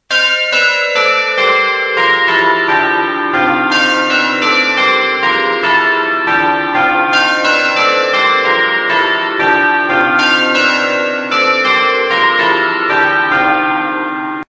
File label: Church Bells ringtone